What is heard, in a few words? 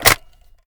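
assault rifle reload magazine in, weapons; viper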